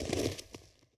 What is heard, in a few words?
snow step; Golden Crayon Sounds